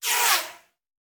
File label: Bullet Pass By; Sound Ideas; Guns